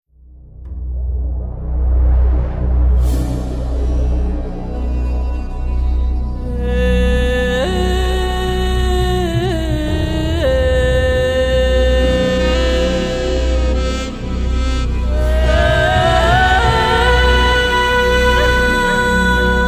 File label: Sax Dub